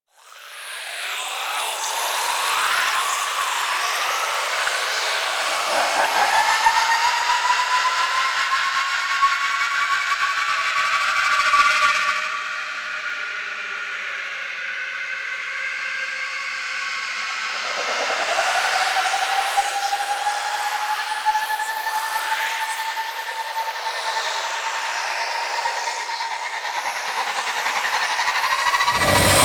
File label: Mind Drill Start; Warframe; The War Within